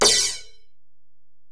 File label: Ride, Alesis DM-PRO